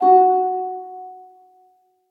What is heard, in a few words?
melody Gb4; fairymirror